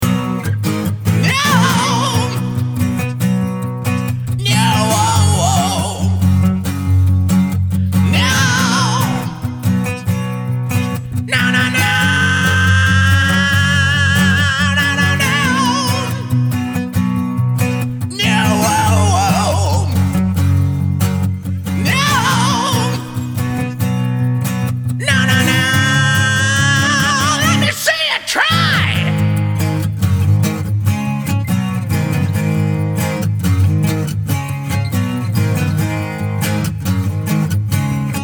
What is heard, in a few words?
solo contest backing track